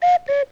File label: Cuckoo, Vintage Cartoon Foley